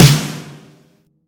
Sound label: soft hitclap; osu!